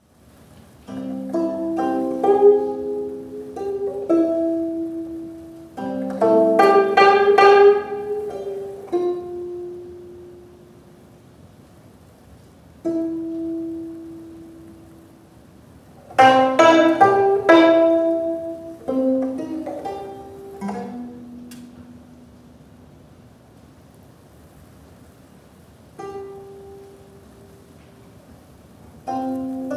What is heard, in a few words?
gayageum poems